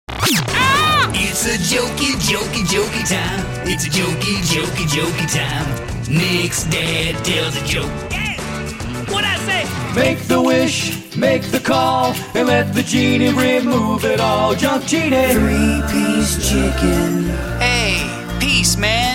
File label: Jingle Samples